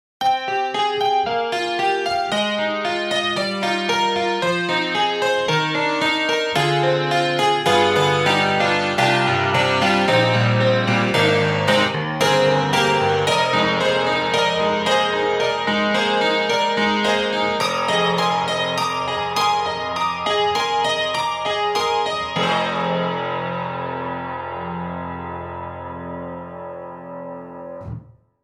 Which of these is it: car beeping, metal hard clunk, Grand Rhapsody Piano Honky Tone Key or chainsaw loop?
Grand Rhapsody Piano Honky Tone Key